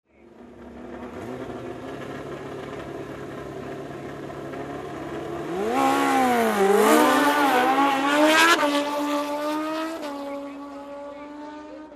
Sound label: Motocross, roar of engines, regassing, start of races; motorcycle racing